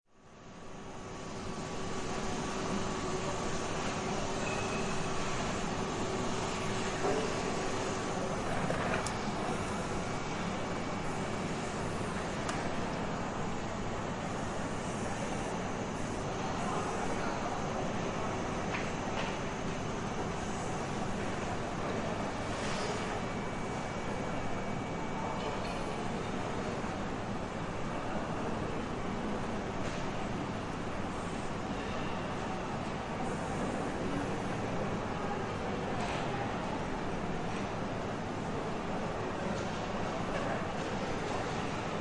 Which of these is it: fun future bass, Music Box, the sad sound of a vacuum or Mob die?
the sad sound of a vacuum